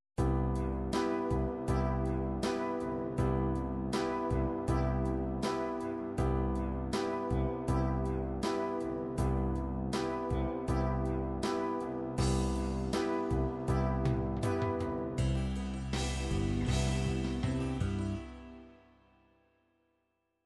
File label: ballads ballad, midi